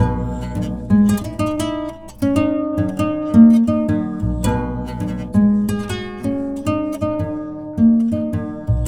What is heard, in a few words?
KORA